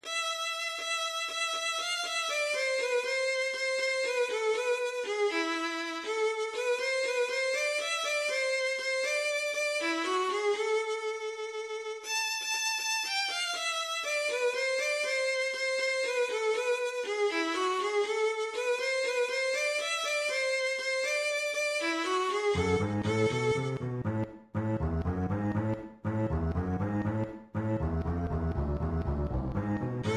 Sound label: violin cello